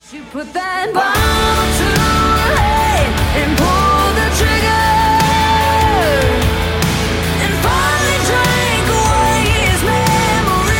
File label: Ringtone